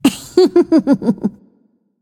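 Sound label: nonverbal heh heh; smite; voicepack; izanami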